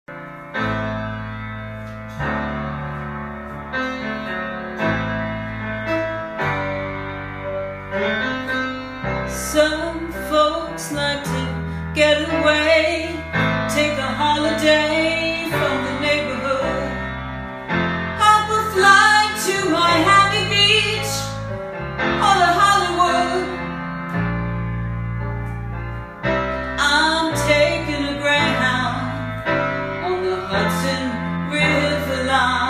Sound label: SINGING